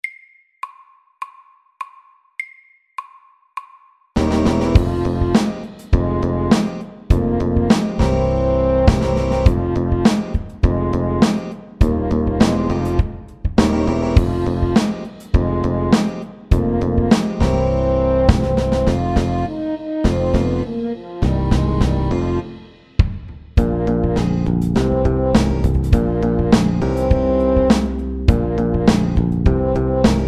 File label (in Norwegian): Funky MIDDELS; Funky monkey